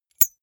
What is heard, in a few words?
key ring small place hard